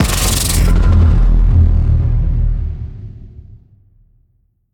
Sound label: Maze Spider; The Inn